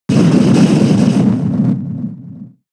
Dig; attack moves; Pokemon; gen 7; SUMO; USUM

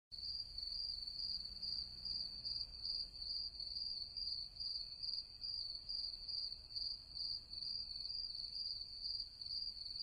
Nightime Crickets; CastleMiner Z; Xbox 360

